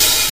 studio open hat one shot A# key, open hat one shot tuned to the A# note